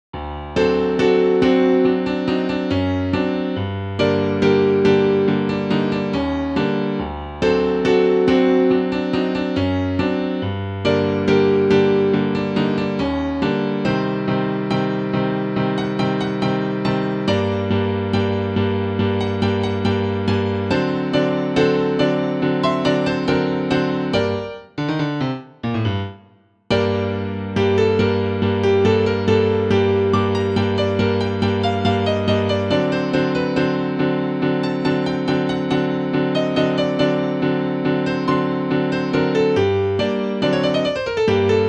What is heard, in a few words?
Tango